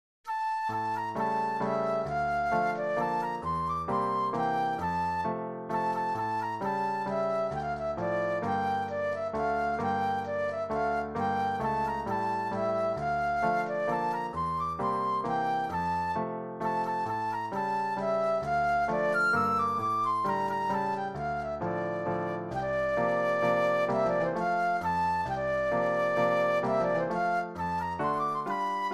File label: Mazurka Flute Solo; classical; Chopin; op. 67 no. 2